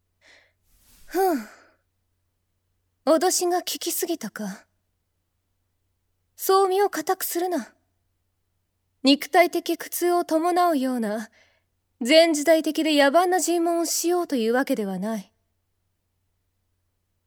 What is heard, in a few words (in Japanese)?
耳舐め責め, ASMR; 1日目・昼